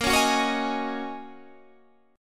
Gb 6th Add 9th Bb, guitar

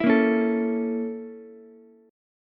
Bb Minor Major 7th Flat 5th Bb, Ukulele; BbmM7b5; strummed; JGuitar

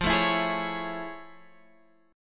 A Major 7th F; AM7/F Chord {X 8 7 9 9 9} strummed

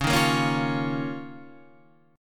D sharp 7th Suspended 2nd C sharp

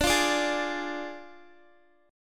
D Diminished, Guitar; strummed; x,17,17,19,20,x; 4F,4A,5C,5G,5C,6E

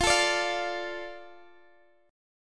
F 6th, Guitar DADGAD; x,x,15,14,17,15; strummed

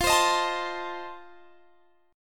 F 7th F down; strummed

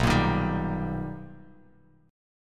B Augmented Major 7th B Bass Standard, chord; strummed; JGuitar

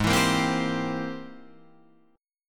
Ab Augmented Major 9th Ab Guitar Open D

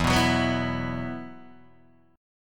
E Suspended 2nd E, Guitar, DADGAD

